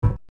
clank, footsteps

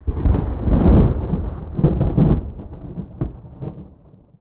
thunder; Ambient; MX vs ATV Unleashed; XBOX

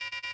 Beep

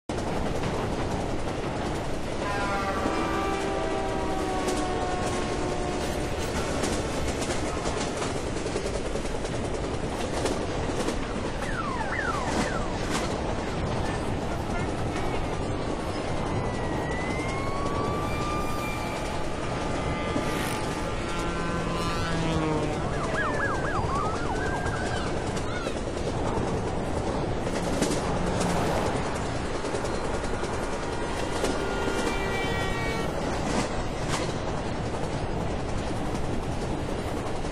ambient; Marc Ecko's Getting Up: Contents Under Pressure; XBOX

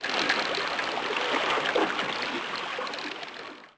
squeak, SEA; AMBIENT; Pirates Of The Caribbean

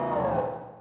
hangar crane end; machines mechanical

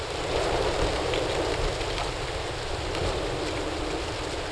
Tree wind; Star Wars Knights Of The Old Republic II; XBOX

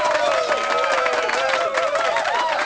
crowd; Ambient; MX vs ATV Unleashed; XBOX

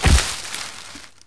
Med step, Dino

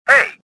anger; worker2

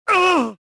pain; rebel

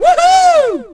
crowd; Tony Hawk's Project 8; XBOX; human

